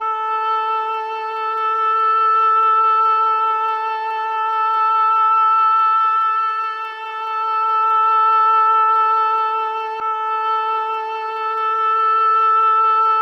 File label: A string